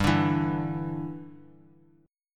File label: G 5th 6th G, chord; G56 Chord {3 5 2 X X X} strummed